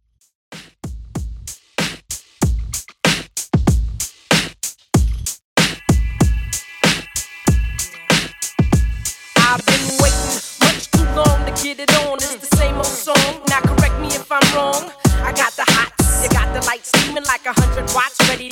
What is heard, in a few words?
Old School Redrum 95Bpm